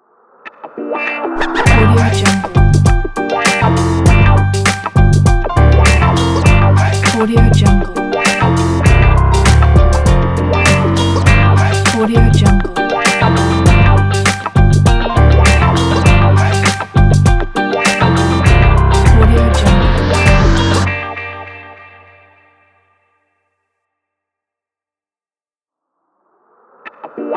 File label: blog podcast opener